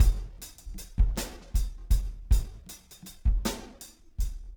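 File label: snare beat 6 8, Room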